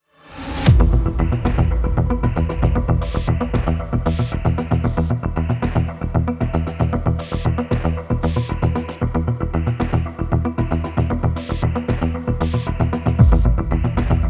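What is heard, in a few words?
tension no violin no bells; gerilim; tension vol 4; By Burak Sound Bank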